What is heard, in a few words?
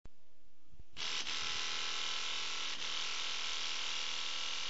air sander